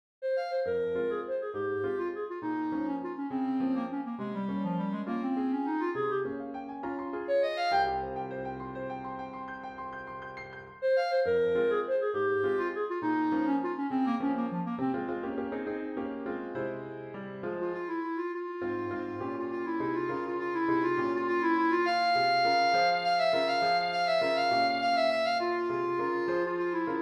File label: impromptu for clarinet